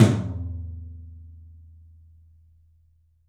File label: 13 TOM, 3D Drums; 3D Sound